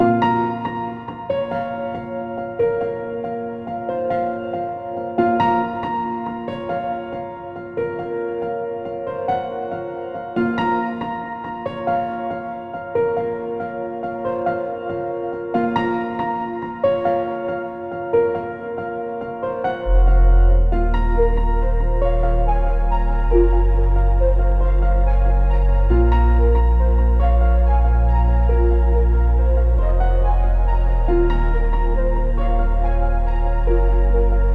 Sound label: BGM field frost